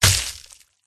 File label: cat attack contact